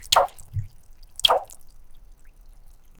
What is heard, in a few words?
a sharp quick splash sound, frogs